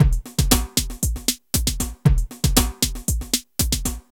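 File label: drum loops, right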